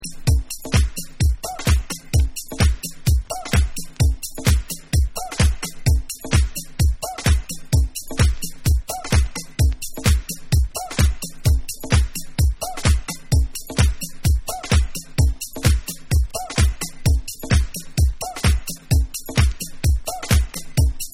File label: Beats